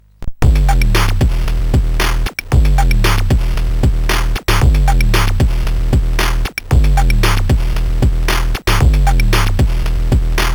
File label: Remix sid CSG8580R5